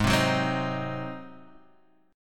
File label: G sharp 7th G sharp, Guitar Standard; G#7; strummed; 4,3,4,5,4,x; JGuitar